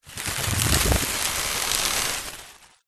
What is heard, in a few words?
foliage plant growth time lapse rumble swell twigs crack leaves rustle dense; Skyclad Sound